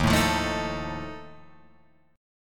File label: Eb Suspended 2nd Flat 5th Eb down Guitar Open F; Ebsus2b5 strummed; JGuitar